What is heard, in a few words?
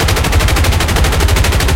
m2 loop, guns; War Thunder